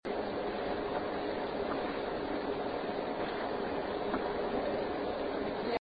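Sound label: Closet Noise, paranormal evidence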